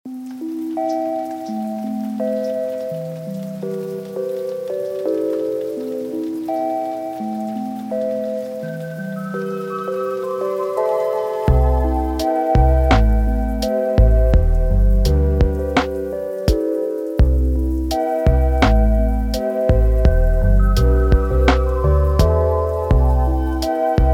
Spring Rain

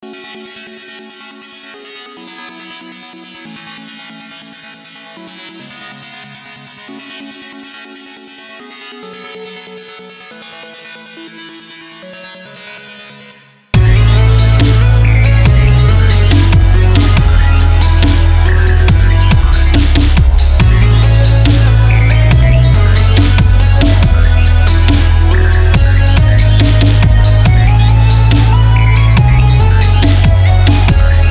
Wall of Saw Waves

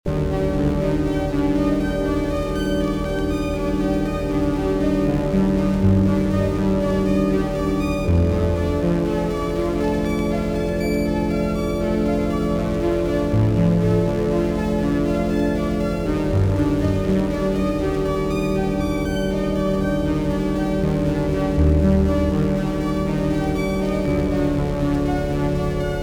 The 80s Spacewalk Loop, Downtempo

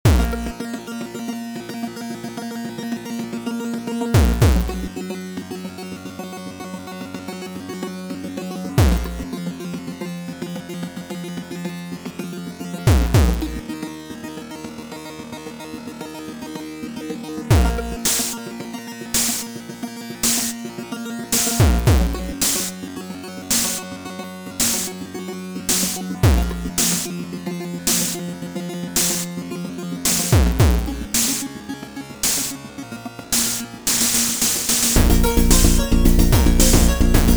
Epic Chiptune Thunderdome

